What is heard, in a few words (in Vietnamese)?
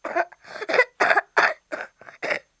Tieng tre em ho